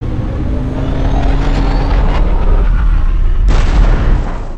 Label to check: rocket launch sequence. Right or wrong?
Right